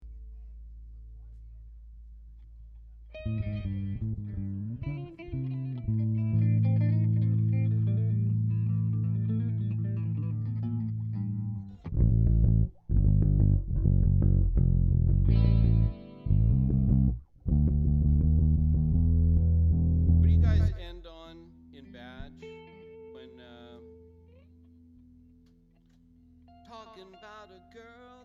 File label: Strings Mix